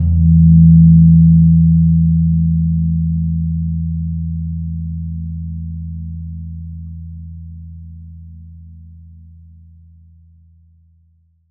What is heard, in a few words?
GONG E2; USB Soundscan vol.60; Asian Traditions; AKAI